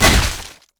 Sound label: basic attacks; LoL; vi